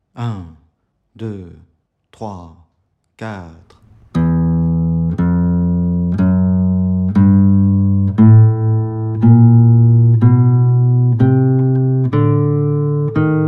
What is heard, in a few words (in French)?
exercice 060bpm